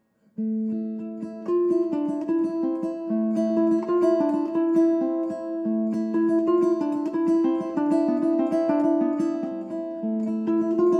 compressor; pc 2a

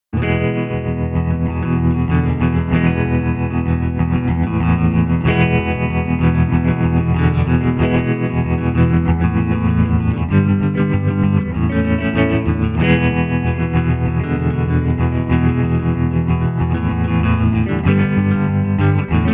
twin stutter; effectrode

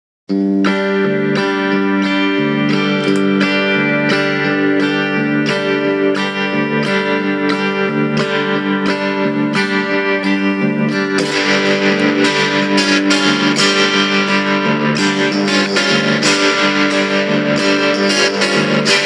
helios tube fuzz; effectrode

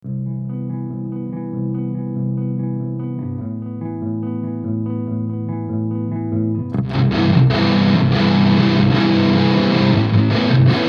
grunge; effectrode

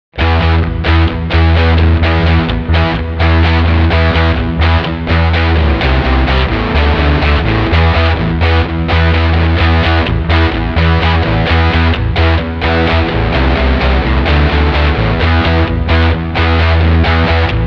tube fuzz; mercury fuzz; helios; masters of the universe

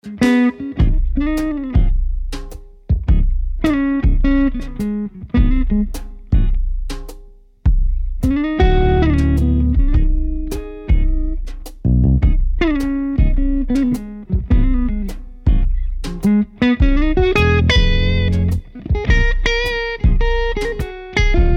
clean jazzy

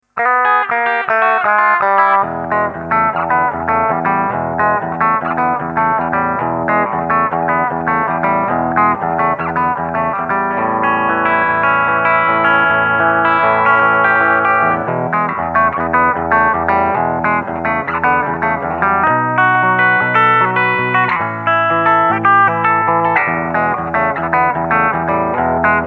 clean fingerstyle

